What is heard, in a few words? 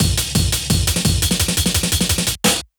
Fill, breaks; M8